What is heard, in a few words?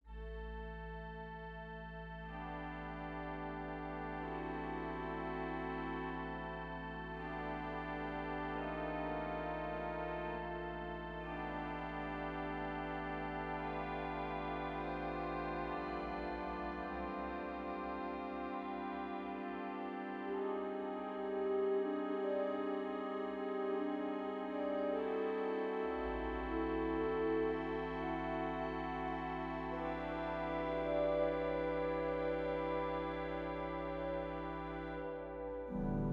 Improvisation, organ; organteq1